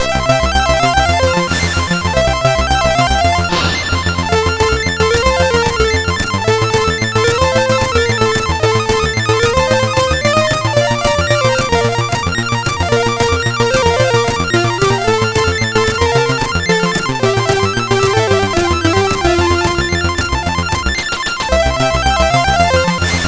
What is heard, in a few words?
Paddle Mania, CSG8580R5